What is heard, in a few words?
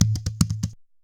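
kalabass groove; Best Service; World Colours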